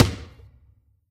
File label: Special Basketball Bounce